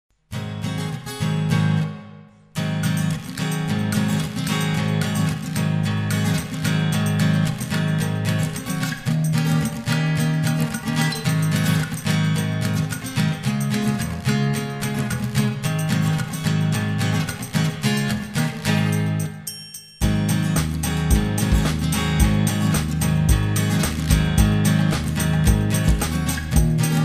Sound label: electric guitar backing track